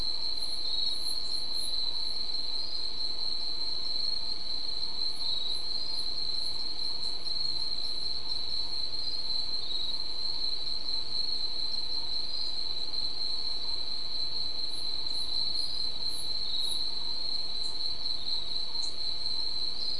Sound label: mainly insect